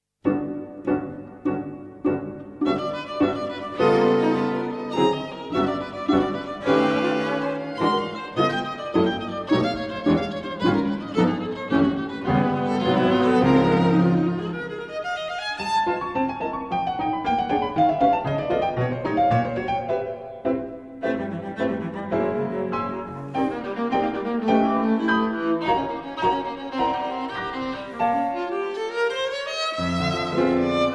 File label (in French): Quatuor pour piano, violon, alto, violoncelle, Andante